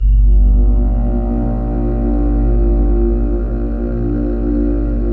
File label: A#3 BASS; Northstar; The Wizard VOL-1